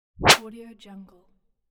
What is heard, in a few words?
whoosh; dynamic sound